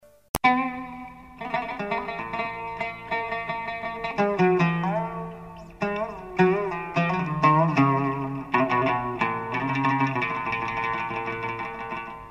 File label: Debka Oud, Folk Dance Music